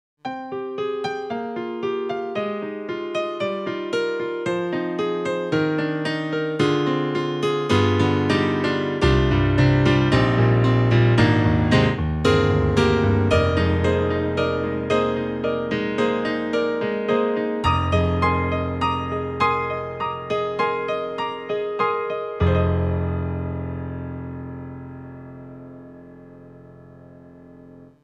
Korg X50 Stereo Piano

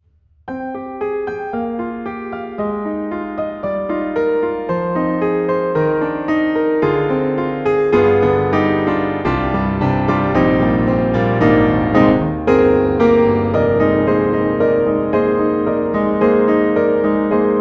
Pianoteq D4 Jazz Recording AB; MODARTT S.A.S.

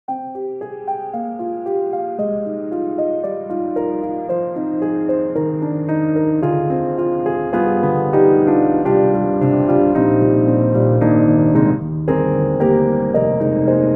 Peaceful Meditation; Steinberg; HALion 7; Verve

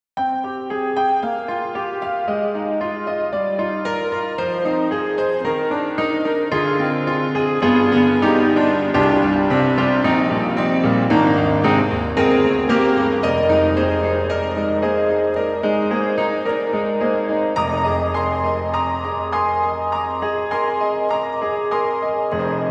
Grand Rhapsody Piano Multi FX Piano; Waves

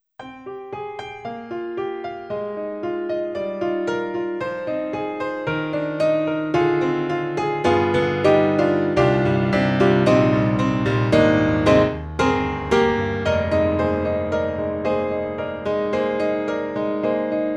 Studio 7ft, Studio Grand; Synthogy; Ivory1

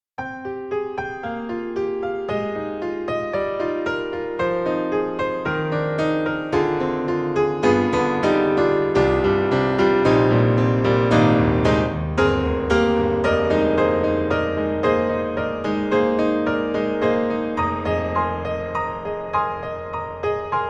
Model D; Steinberg; The Grand 3; Spotlights on Model D

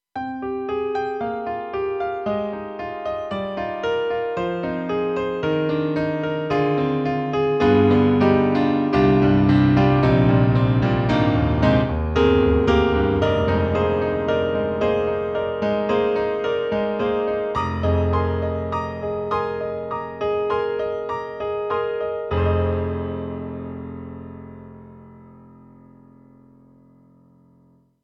KORG M3 XPanded Program Stereo Grand

